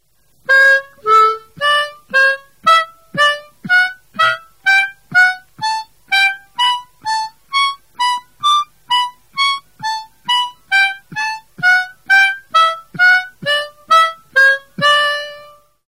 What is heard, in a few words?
sliding